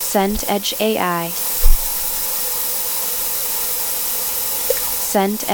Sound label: mud bubbling geothermal